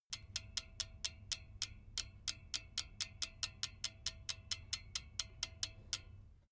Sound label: bmx bike freewheel click very slow